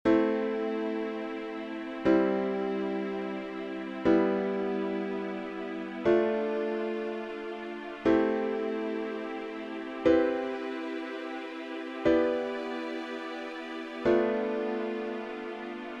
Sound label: A Minor I V vi IV